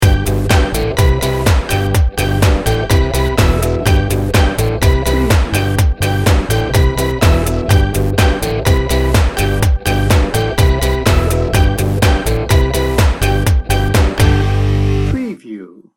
beach party 15 second

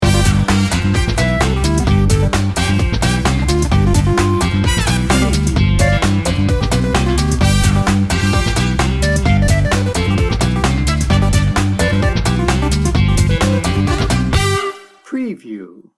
funk n blues

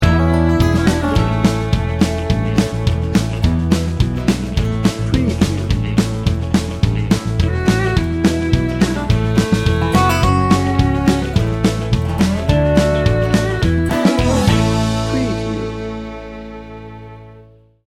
country hoedown

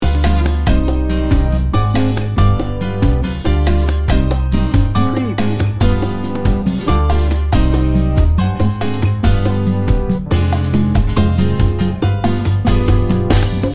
cafe cubano loop

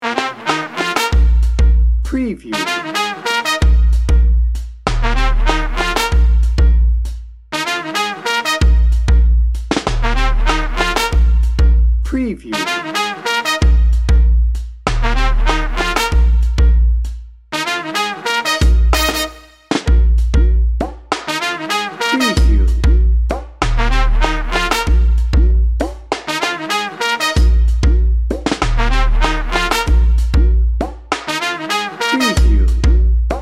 swing town

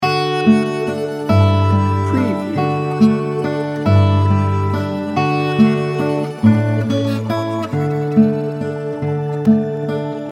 floating lazy day loop

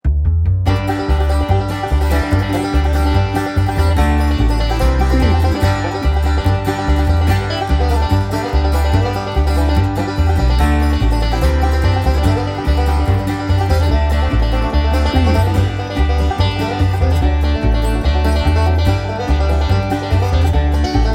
oo-wee bed

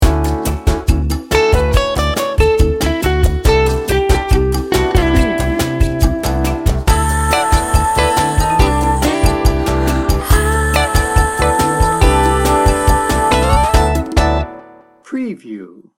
lite pop jazz

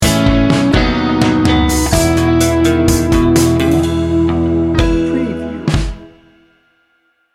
lazy summer day sting bumper

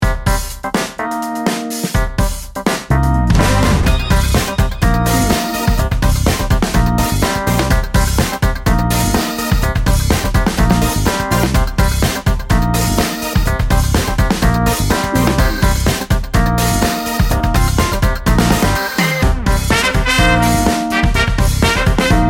festival of funk

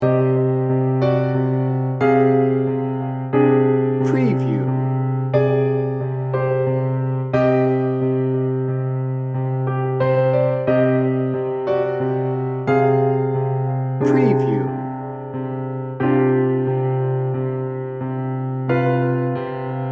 dark shadows loop

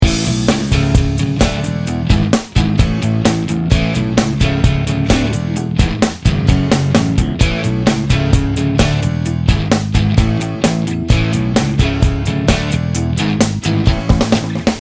jump start loop

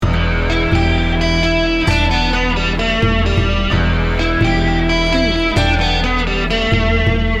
bold and determined loop

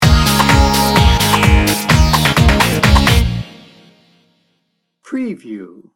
funky fantastic sting bumper

